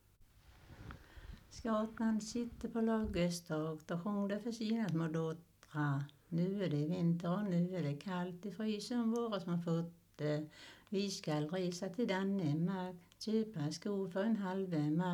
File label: Folk music of Småland; Skatan sitter på lagårdstak